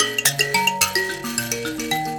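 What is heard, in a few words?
grand mbira phrase, African; Roland LCDP11 Africa VOL-1; M'biras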